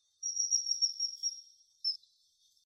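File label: common kingfisher call; kawasemi nakigoe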